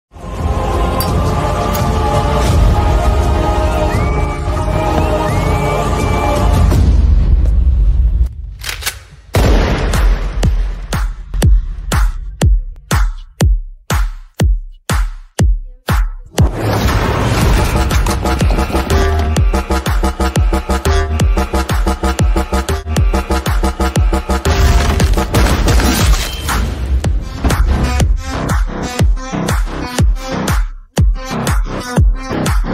animal trailer bgm animal movie bgm; rashmika mandanna

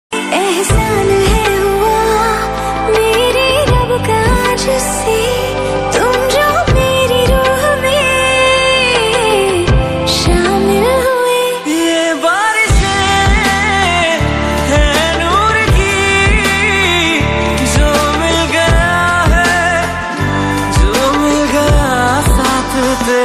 mobile ringtone love ringtone; ruposh movie

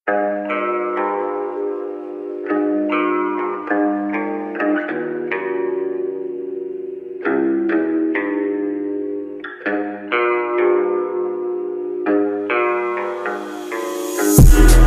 full bass boosted remix

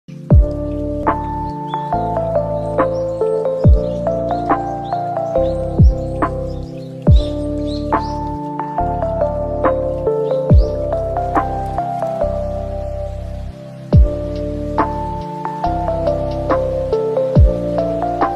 lofi instrumental

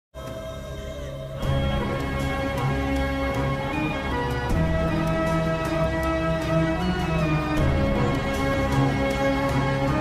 climax bgm ringtone; Aayirathil Oruvan

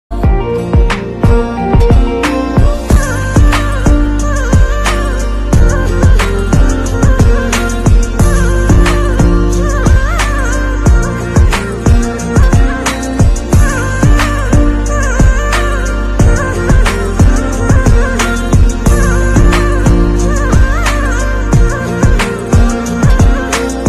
music ringtone

